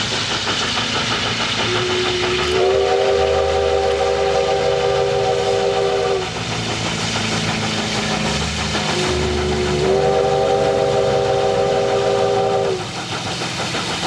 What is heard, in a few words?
whistle